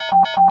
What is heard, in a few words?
big win loop; eggomatic